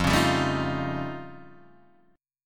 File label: F Augmented 9th F Guitar Open D, chord